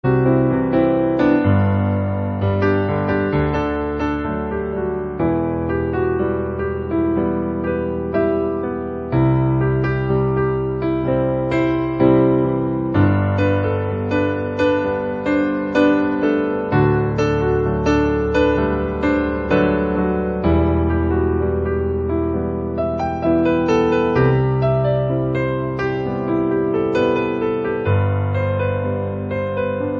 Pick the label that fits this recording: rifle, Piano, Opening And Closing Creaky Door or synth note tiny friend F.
Piano